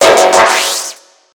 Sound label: alert; corrosion